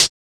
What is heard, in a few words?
Closed Hats